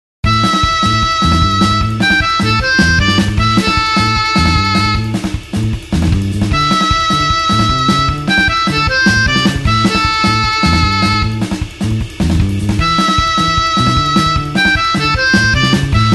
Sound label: blues harp 8beat A